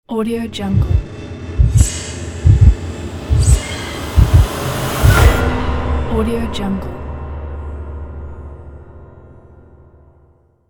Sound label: horror logo; pillowproductions